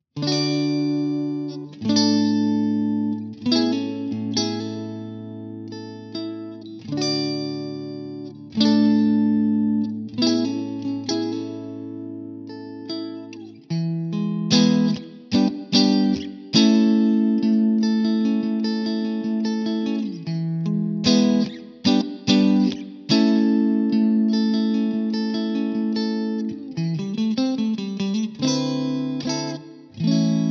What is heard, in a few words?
clean; jensentone